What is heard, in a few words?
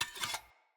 Player Short Sword Unequip; Weapons; Swords